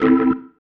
Stab, Organ, One Shots

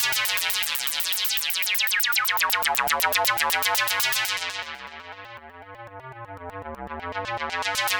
Synth

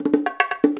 FILL, Percussion

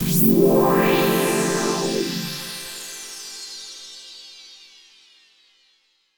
Epic Pad, Underground UK House & Electro, Pads; Sonic Foundry (Sony Creative Software)

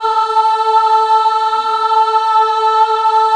A3 WOM AH, Choir; USB Soundscan vol.28; Acoustic & Synth; AKAI

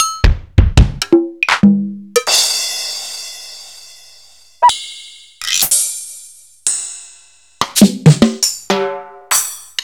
Yamaha DD-50

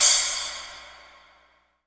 IMP CYM CRASH, Cinematic Impact

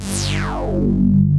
FAT BUGGR, BASSES, Synthsonic; Pro-Rec - Synthsonic

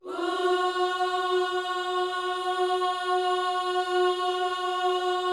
OOS F#, Vocal Gospel

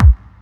Bassdrum Clean; Drums; House; Best Service; House Definition VOL-1

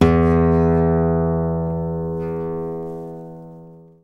GTR E1 RHASPY, Nylon Guitar

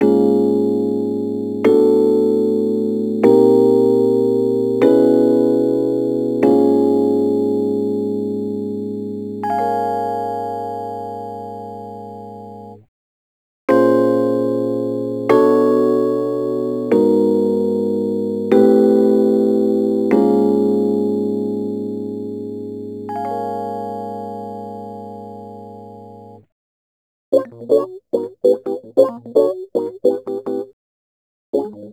Rhodes Attacks; Ueberschall

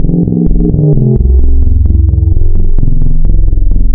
Spectral, wavetables; Architecture 32

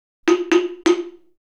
Slit Drum; Sonic Foundry (Sony Creative Software); Essential Percussion; Drums

